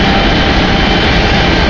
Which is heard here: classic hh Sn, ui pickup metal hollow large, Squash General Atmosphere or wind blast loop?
wind blast loop